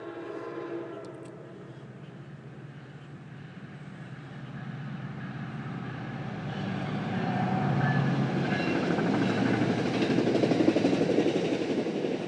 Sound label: the alarm clock just rang